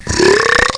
belch

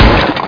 crash med; rashdemo

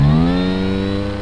A-10 flap going up; A-10 Attack!

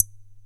MARACAS; Real Oldies2

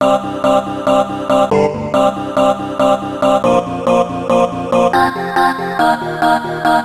synthy, synthesizer

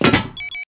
charge place; MOTS

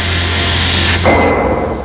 space doors

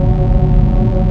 BUGGY; Shadow Master

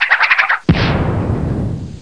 bang; 3D WORLD TOUR

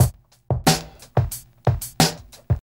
HipHop Backbts; LiveAct Pool

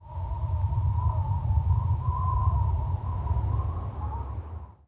WIND, wind

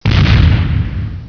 rock

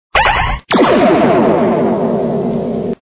wrong slide; Together in Jesus - First Reconciliation - Computer Fun; word snatcher

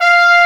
french horns F4; Star Trek JR Audio

